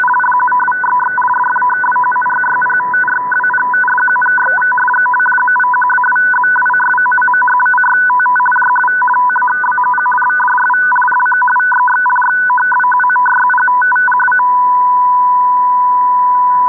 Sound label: f1b 500 hz 50 bd, fsk